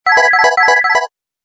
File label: Serene tone; Samsung; SGH-U900 Soul